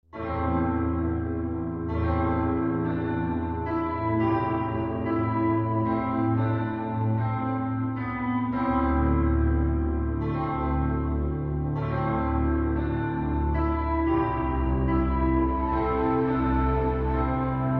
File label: piano